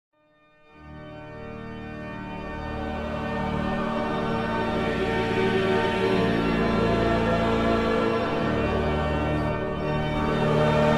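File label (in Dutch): Psalm, orgel; Nijmegen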